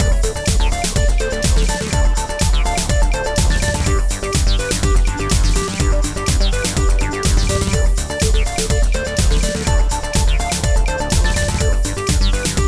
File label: happy trancin